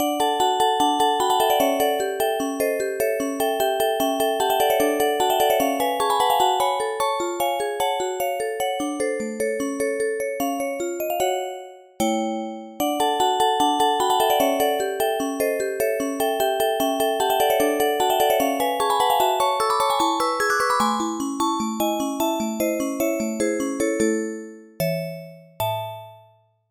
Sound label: jingle